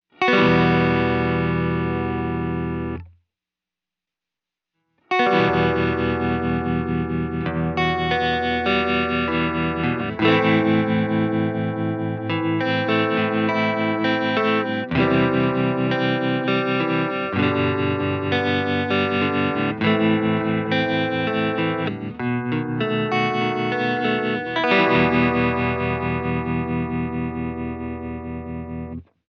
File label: Barbaric Trem; Full wet Mix, Minimum Depth, and the Barber Sweep create a trem-like effect as the LFO makes its rounds.; Way Back Machine.